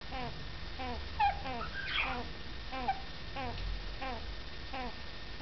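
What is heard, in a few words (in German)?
hoerbares atmen bei tumorerkrankung, wellensittich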